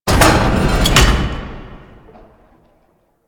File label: Vial Hazard Restock Sphere; Entrati; Hazards